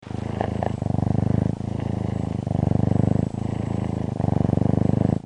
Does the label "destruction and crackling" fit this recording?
No